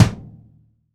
KICK, 15INCH KICK

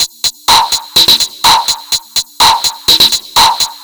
Percussive

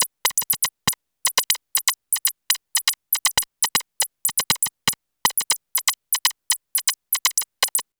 Anabeat Hat

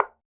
Bongo Hi Slp; Percussion

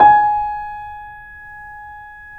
C7; East West; The Ultimate Piano Collection

